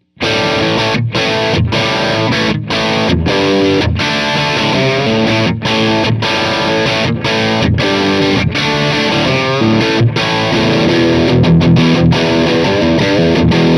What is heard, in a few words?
bright crunch